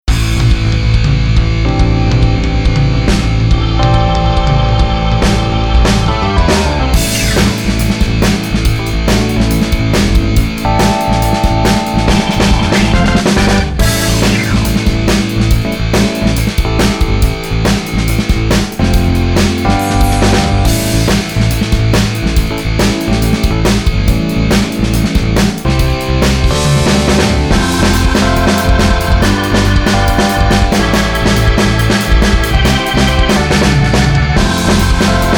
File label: Rock; ABILITY